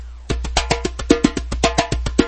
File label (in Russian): dzhemba melodiya skoraya